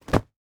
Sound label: Horror Body Fall On Cement